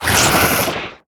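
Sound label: Teleporter Stealth Attack; Evolve; Wraith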